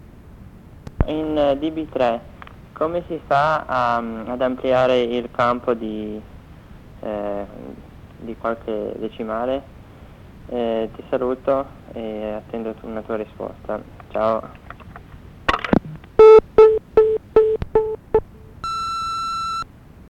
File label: beat